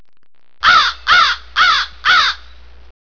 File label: crow; de alps